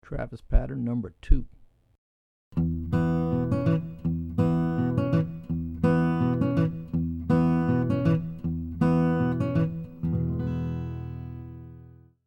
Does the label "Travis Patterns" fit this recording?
Yes